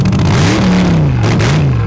car

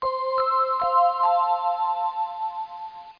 WINDBELL

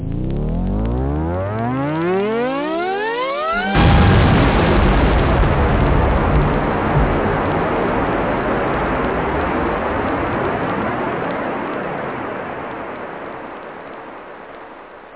bigbang, whoosh; da capo vol1

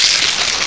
paper tear; Virtual Graceland

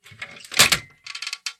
garden Lever Fail; Keepsake

